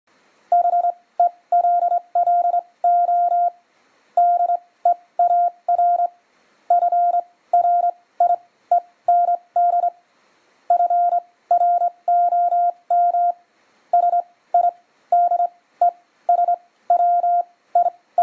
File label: hacksaw blade demo